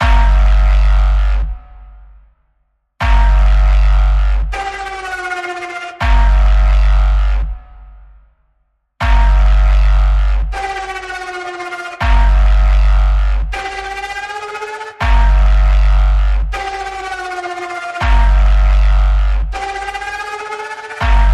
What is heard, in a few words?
Mechanical Massacre Pulse G, Key of G